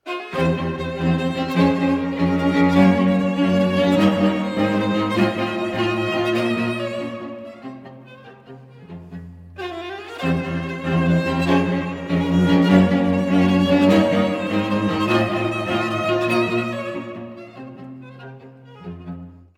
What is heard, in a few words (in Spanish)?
cuarteto